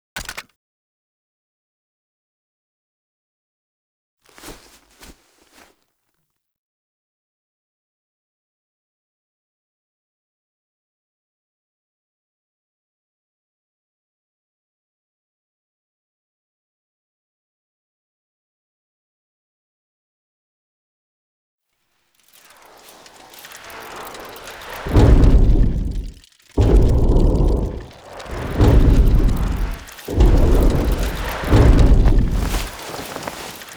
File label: Landing Foley; Cinematics; Cetus Landing